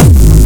HardCore Kick

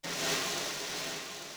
snaredrum, Yamaha RM 50

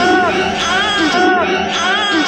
Dark Loop, Sound FX

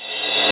Crash Reverse, Yamaha TG-33; Drum Samples

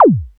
Click, Roland System-100; Drum Samples

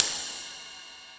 CYMBAL, Yamaha RM 50

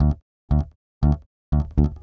Tenor Quarters

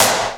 Hyper sd, Roland MC-303, Snaredrums; Drum Samples

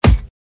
kick

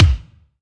Kick Room Punch

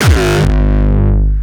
kick; shock. Ultimate Kicks vol. 2

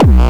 bombo, kicks, Hardcore

